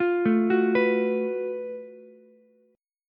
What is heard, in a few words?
F sharp Minor Major 11th, Ukulele; arpeggiated; JGuitar